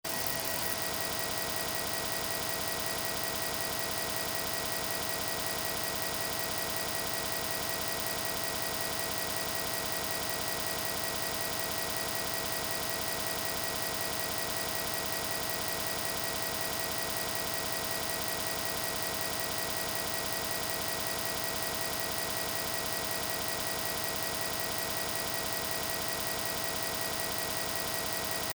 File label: ekg machine background noise